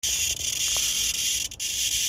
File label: crackling hissing noise engine bay, xkr